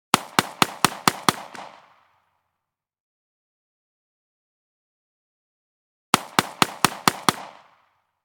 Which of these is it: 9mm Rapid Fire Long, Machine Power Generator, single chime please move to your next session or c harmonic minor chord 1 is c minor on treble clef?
9mm Rapid Fire Long